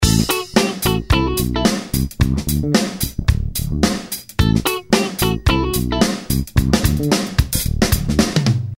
hop rock

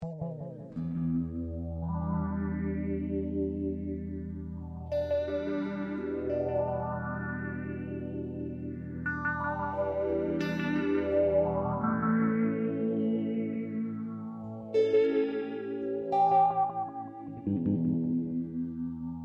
Memory Small Stone

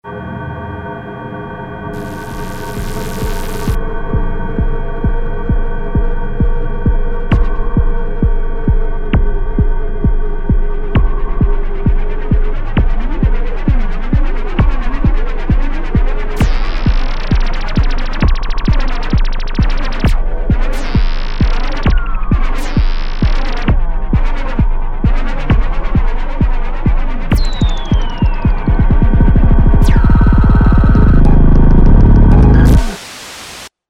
Percs and Noise

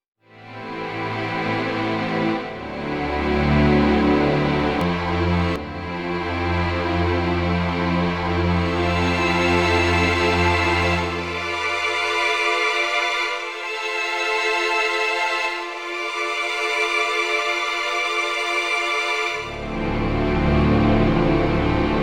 string Ensemble

